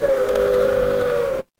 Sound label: mechanical engine